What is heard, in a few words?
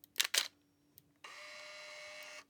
Polaroid Camera Shutter